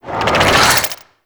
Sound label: Tendrils Cast; Warframe; Infestation